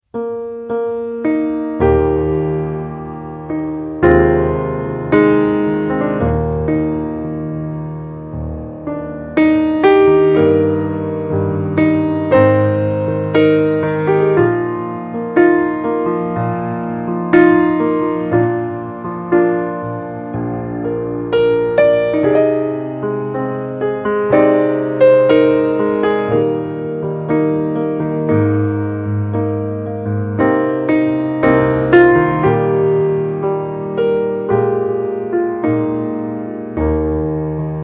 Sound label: INSTRUMENTAL RINGTONE